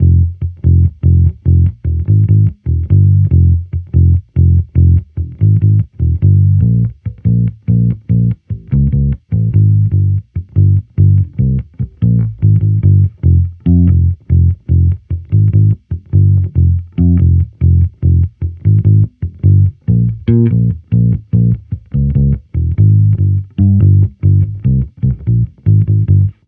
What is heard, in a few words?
Amped loop 145 Fm, Electric Bass Loops; Slate Digital BASSHOLE Sample Pack